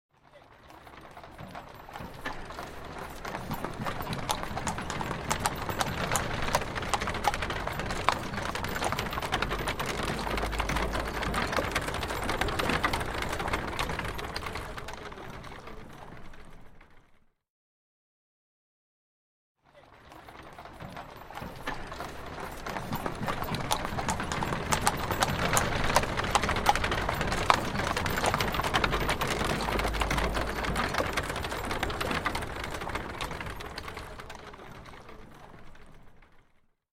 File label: Light Horse Drawn Carriage; Horses Carriages and Traps; Hollywood Edge Historical Series